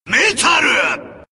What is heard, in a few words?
metal